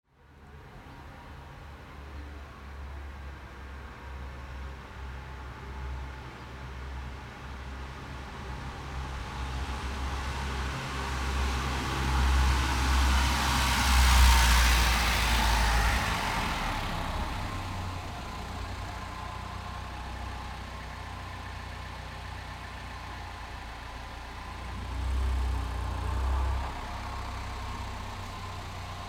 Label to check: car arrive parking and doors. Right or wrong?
Right